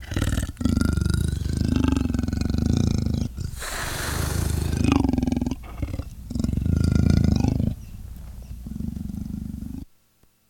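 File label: Leopard Idle Growling; Touchscreen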